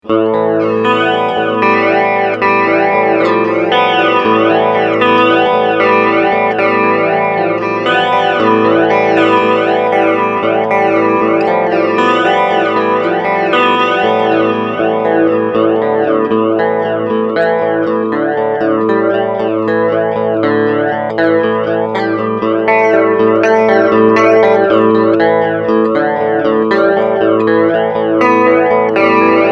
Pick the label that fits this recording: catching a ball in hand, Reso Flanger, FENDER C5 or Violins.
Reso Flanger